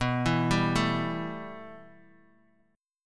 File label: B 7th Suspended 4th Sharp 5th B down Bass Standard; B7sus4#5 arpeggiated; chord shape; JGuitar